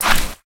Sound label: attack fairy